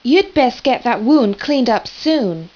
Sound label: wound clean; wanted